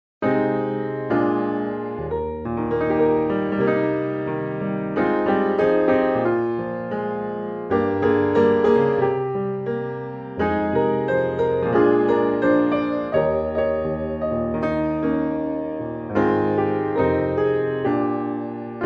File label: ALTO